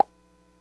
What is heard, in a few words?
loading bar flower; Plants vs. Zombies